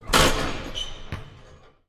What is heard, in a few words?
Basketball missed shot